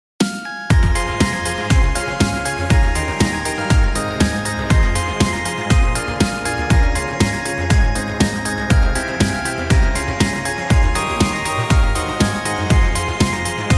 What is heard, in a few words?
Electronic